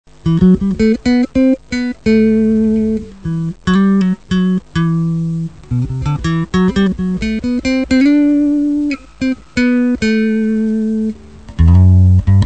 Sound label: Basssolo